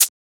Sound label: Shaker; FLPRO